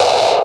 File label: FUZZ CLAPZ; Spectrasonic Distorted Reality 1; PHUZZ DRUMS